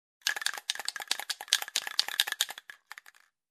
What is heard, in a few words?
Shaking dices